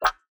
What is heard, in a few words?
suction; soundscrate